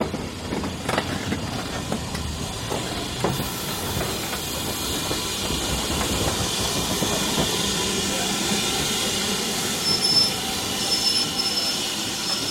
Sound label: rolling in steam train arrival at goathland station